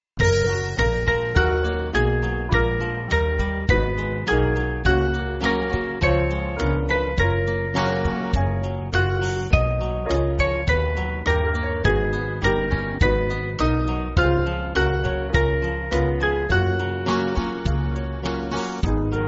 Lamp Of Our Feet, band

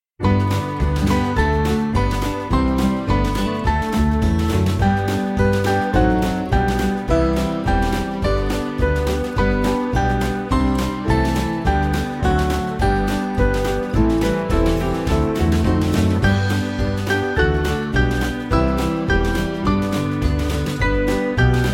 Spanish Hymn Band; Christ Whose Glory

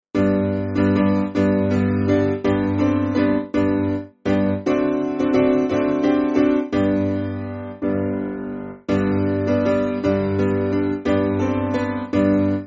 Piano; If You Are Tired; McConnelsville

